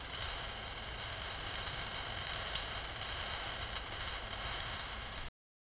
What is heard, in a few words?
mechanical gears grind; activation